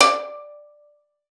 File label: D5, VIOLIN PIZZ; Xsample VOL-5; 90 s Sample CDs